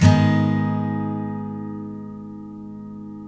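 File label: STL G# Minor DN, Guitars steel chords; Spectrasonics; Hans Zimmer Guitars VOL-1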